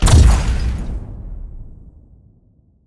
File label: robot explosion; fight